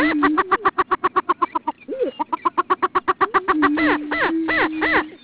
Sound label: prairie chicken, clucks